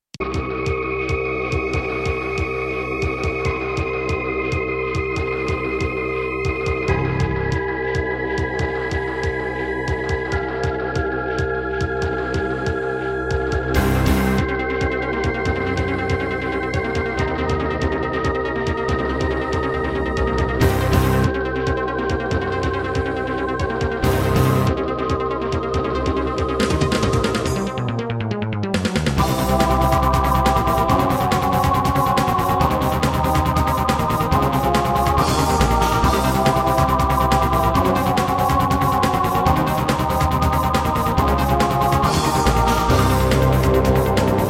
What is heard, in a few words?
Roland XV-2020; sigil doom episode 5